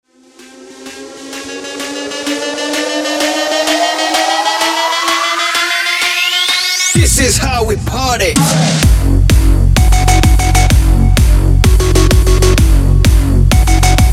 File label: EDM Festival